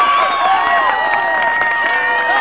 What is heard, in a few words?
cheers, 人群; 人群系列声音素材